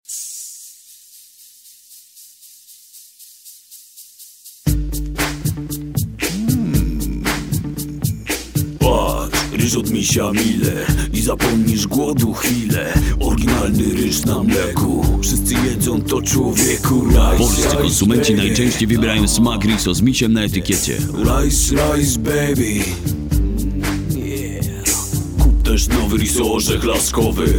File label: rap milk ad